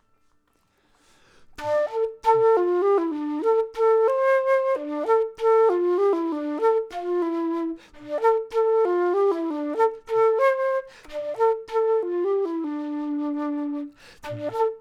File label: flute